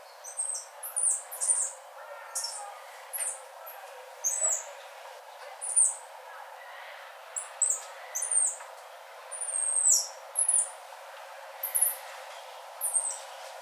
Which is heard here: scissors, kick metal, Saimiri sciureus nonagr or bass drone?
Saimiri sciureus nonagr